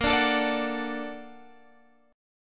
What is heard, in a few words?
A sharp Augmented Major 7th A sharp, Guitar Half Step Down; chord